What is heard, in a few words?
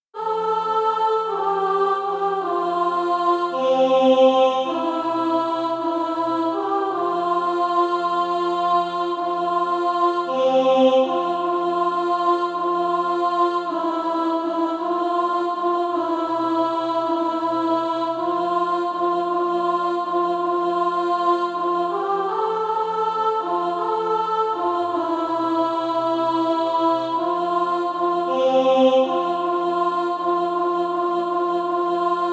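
alt, Practise Music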